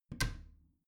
bathroom cabinet warped close soft

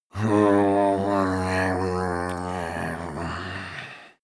male growl tired; menschen; koerpergeraeusche; soundpool

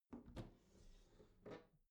bathroom cabinet open soft squeak; objekte; tueren

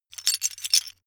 metal pieces clatter short

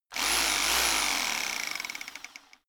rock drill chisel burst rev up; schrauben drehen hydraulik

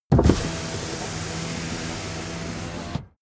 car window front open full

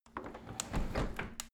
window handle close tilt; objekte; fenster

